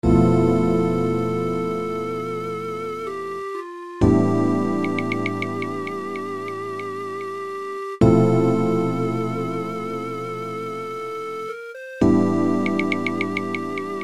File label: flute melody